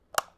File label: switch, UI Audio; Kenney's Sound Pack